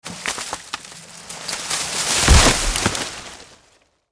Impact Tree Fall Forest Leaves; Thuds; Sound Effects:Objects; Blastwave

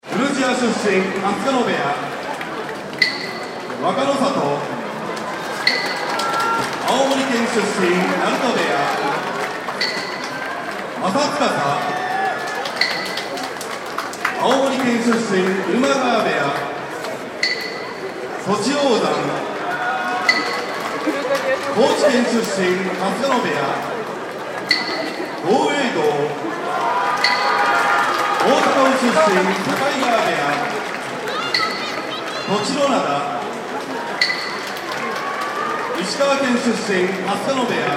Sumo Match Claps Tokyo Japan Crowd Cheers; Sumo wrestling match, sports event in Tokyo, Japan. Announcer speaki; Arena Sound Effects; LynneMusic

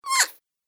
Gremlin Innocent, monster; horror

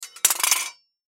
Sword Drop Rattle; Combat; Warfare; Blastwave

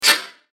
Transition Scrape Short Swell; Digital Clicks; Multimedia; Blastwave

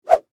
Whip Whoosh Medium, Dowel, Rod; Foley

